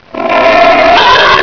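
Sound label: attack growl, bullchicken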